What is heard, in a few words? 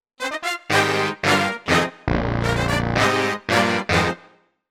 brass